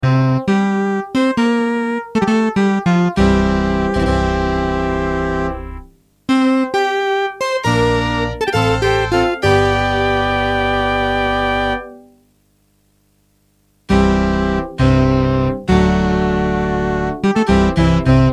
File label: on 80s yamaha electone